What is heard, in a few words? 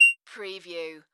Multimedia Beep